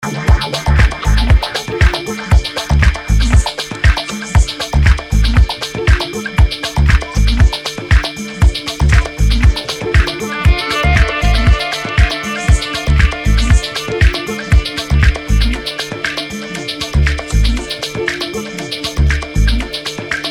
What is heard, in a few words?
accordeon, techno